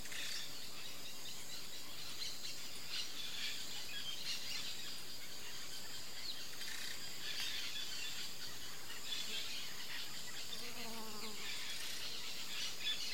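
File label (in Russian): отдалённое спокойное разноголосое редкое пение птиц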